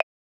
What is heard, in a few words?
button